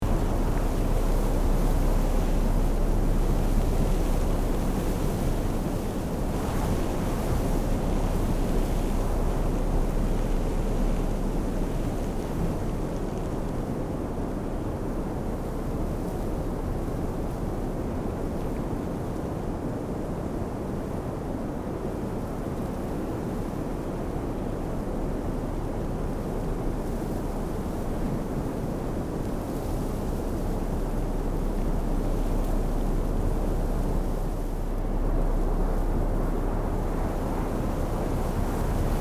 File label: Rocky desert cliff atmosphere